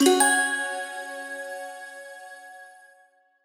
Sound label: correct answer